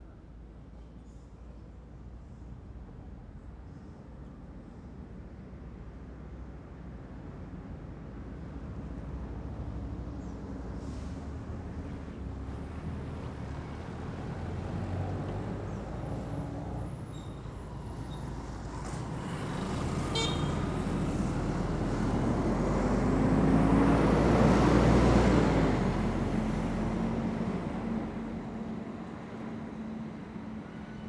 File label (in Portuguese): Moto passando e buzinando caminhao passa junto; maquinas; canais-stereo; colecao sonora do cerrado